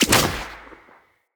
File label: Bow Shot Charged; Josalfar; Weapons